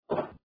Hit Ceramic Wood Down

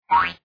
boink

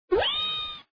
whee

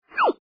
ZIP CARTOON QUICK WHIS

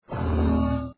HIT CARTOON BIG HEAD

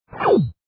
WEAPON LASER VACUUM

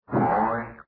BOING CARTOON TROMBOIN

